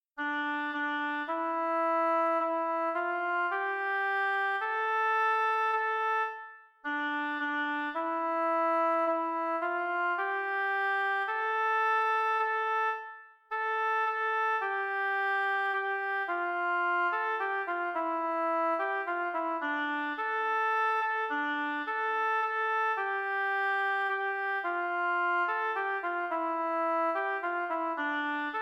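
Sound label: chaconne oboe trio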